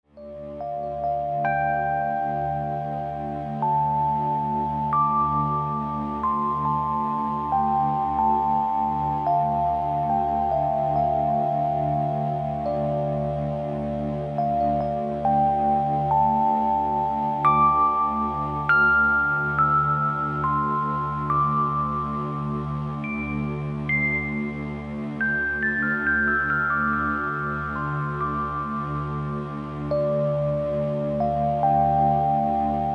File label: epiano